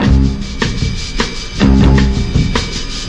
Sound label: 155 spy loop, drum loops and breaks; 141 to 160 bpm